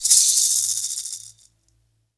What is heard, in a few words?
Maraca Roll; Sonic Foundry (Sony Creative Software); Joe Vitale Latin Percussion